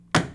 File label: Bang